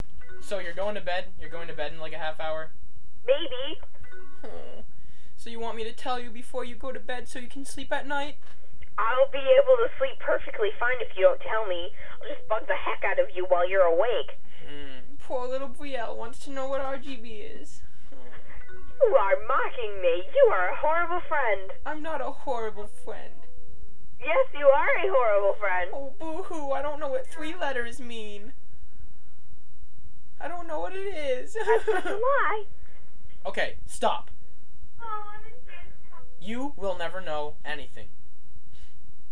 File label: HAHAHA